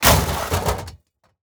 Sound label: plastic break